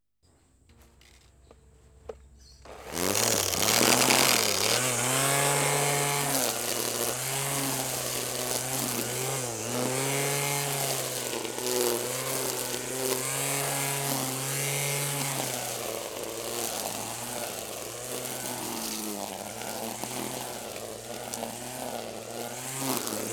Electric Weed Whacker

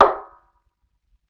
Barrel Hit Medium; Vintage Cartoon; Drums & Percussion

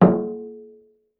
Barrel Hit Medium Ring; Vintage Cartoon; Drums & Percussion

